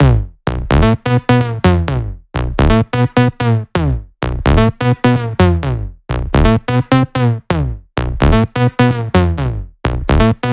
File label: 128BPM Melody; Vengeance Samples; Capone Kit